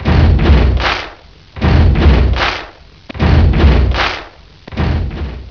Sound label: boom bmx